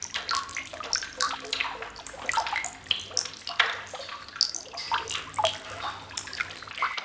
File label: drips; Garry's Mod; tf2